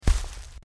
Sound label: heavy foot leaves, dinosaurs; crossyroad